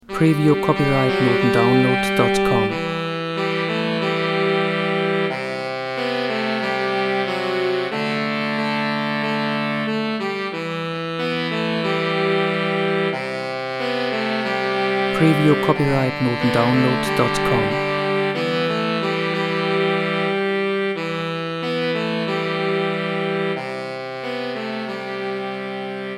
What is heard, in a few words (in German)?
saxofon